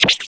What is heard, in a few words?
RSS, 160 BPM